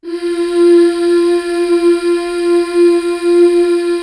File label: MMHH F4; Best Service Gigapack 1